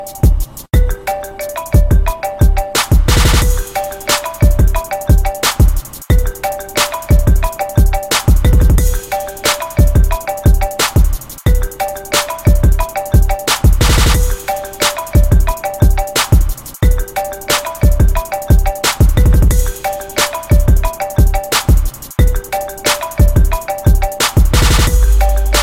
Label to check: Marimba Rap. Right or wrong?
Right